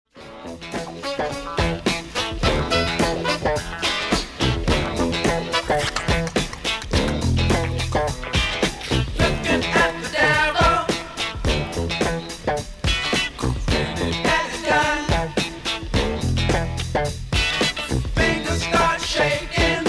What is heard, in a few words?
Funk